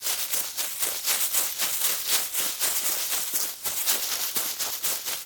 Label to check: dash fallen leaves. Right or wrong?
Right